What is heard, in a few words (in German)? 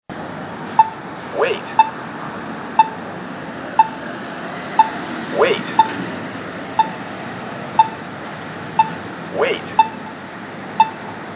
san antonio grundrauschen